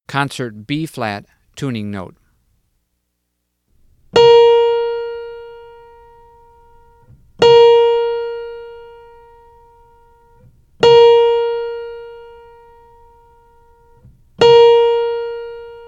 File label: Tuning Notes; James „Jamey“ Aebersold Play-Alongs